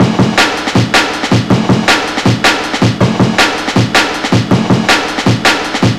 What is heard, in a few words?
STABBER, breakcore 160BPM